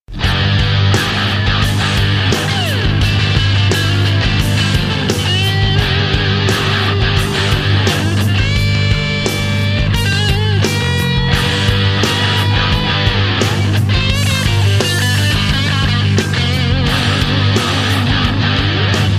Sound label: solo